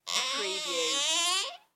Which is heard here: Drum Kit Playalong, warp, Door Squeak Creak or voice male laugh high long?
Door Squeak Creak